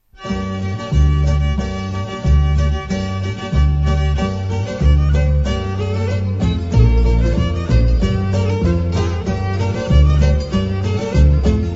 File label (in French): Ikariotikos, Grece